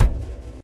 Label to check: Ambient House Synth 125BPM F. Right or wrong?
Wrong